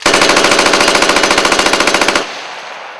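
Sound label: SAW Firing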